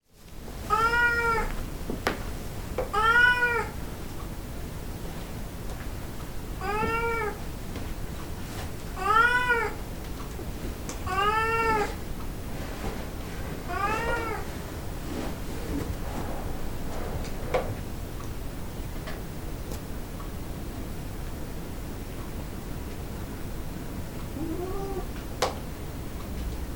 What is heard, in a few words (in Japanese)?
soto ni dase to tobira no mae de naki tudukemasu; 「外に出せ」と扉の前で鳴きつづけます